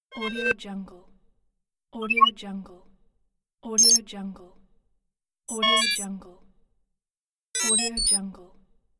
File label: spaceship electronics